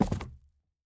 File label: phys cube sm; Portal 2